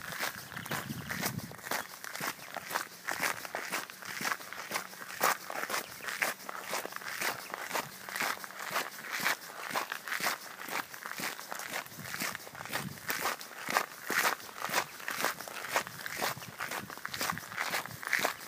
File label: Footsteps